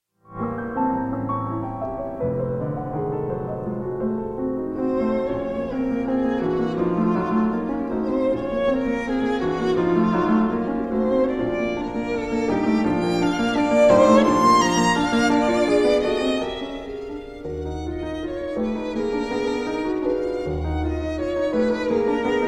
sonata in a major for violin or cello and piano 4th movement